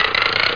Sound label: Swp Lift